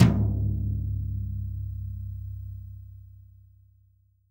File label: TOM, REAL DRUMS, SWING KIT; Best Service ProSamples vol.43; Real Drum Kits